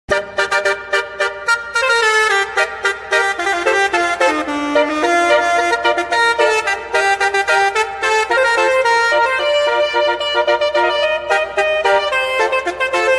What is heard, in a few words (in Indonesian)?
nada dering; Basuri Stecu; Suara khas klakson mobil Basuri Stecu